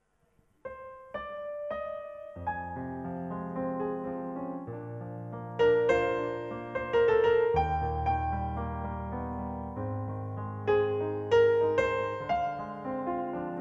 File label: solo piano